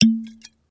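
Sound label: Bottle Open Wine Remove; eating & drinking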